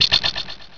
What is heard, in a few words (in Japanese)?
pisyan